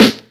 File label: studio steel snare drum a key